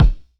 Thud Beefy, Jomox Kicks; Airwindows

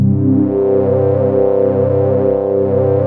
JUP.8 C4, JUPITER 8, Rare Synthesizer; Hollywood Edge - Giorgio Moroder Rare Synthesizer Collection

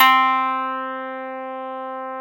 CLAV C

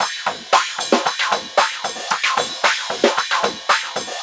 CHILI WAH; Distored Reality

